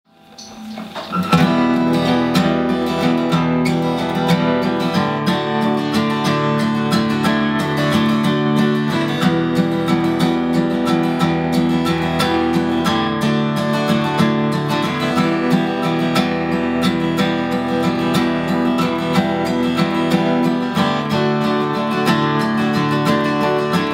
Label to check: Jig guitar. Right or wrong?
Right